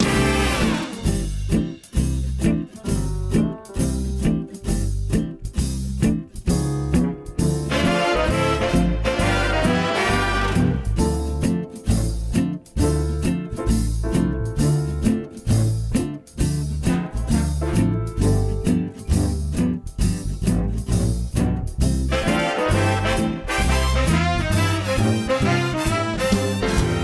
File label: Quizz Music Loop; There Is No Game: Wrong Dimension